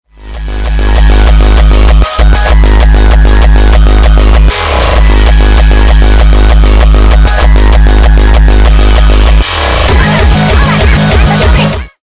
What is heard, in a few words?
frenchcore bass